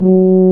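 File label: brass, C Tuba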